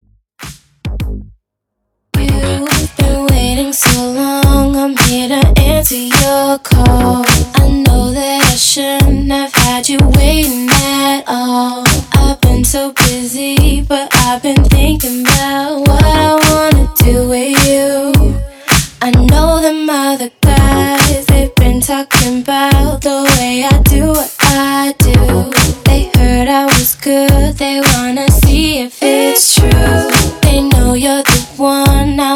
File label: Acap Outro 105 BPM